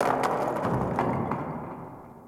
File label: Venus Landscape Rock Slide Low, Ambience; Warframe; Venus Open World